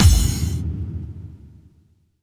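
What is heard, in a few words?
bass drum FX, dance drums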